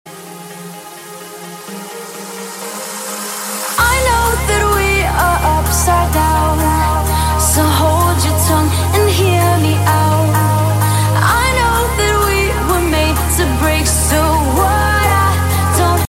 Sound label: intense ringtone